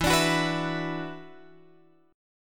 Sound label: E 7th Suspended 2nd E down Guitar Open F; strummed; chord; JGuitar